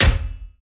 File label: metal punch hit